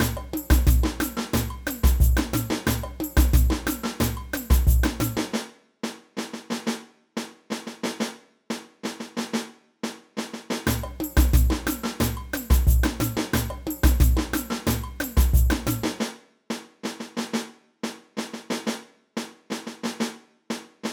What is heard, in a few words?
caixa, samba